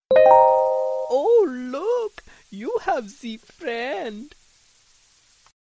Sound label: swedish text ringtone; sms ringtones